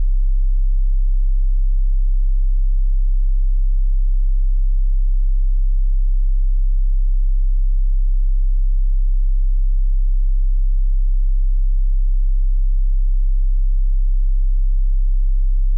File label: tesla 33hz; wardenclyffe